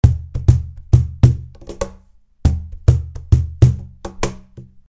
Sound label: cajon loop 100bpm; creaktor; mozee